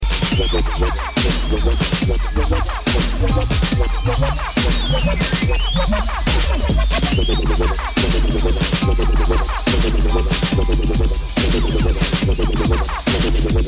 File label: Rhythm; meloboom